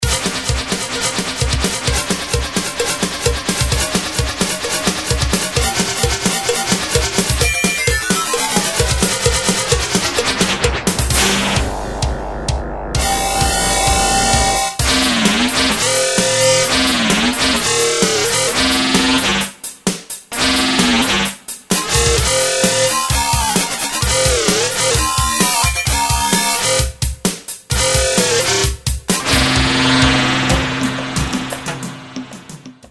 industrial lead pad

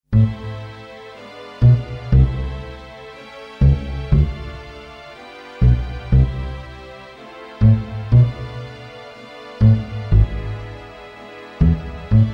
Bass Classical Line 4'4

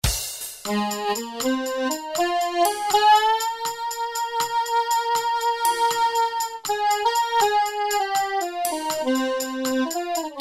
soprano sax bright

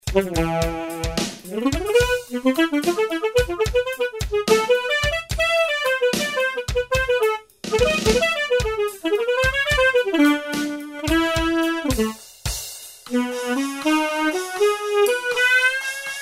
alto sax

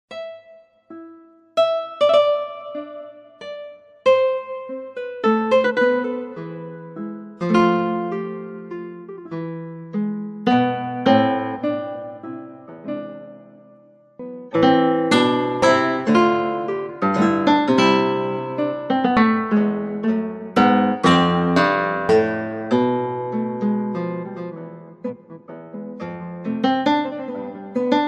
nylon fleta guitar full

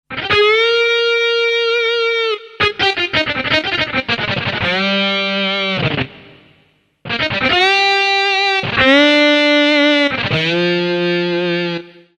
Dist Guitar Lyrical Solo

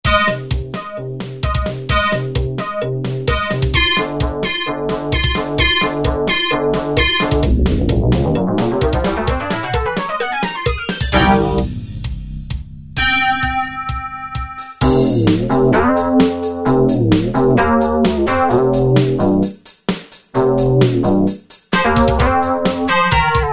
rubber lead

